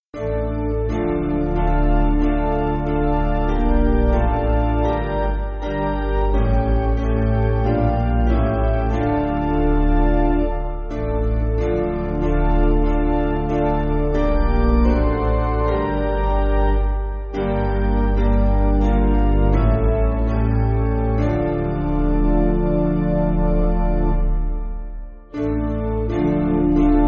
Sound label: Hymnary Piano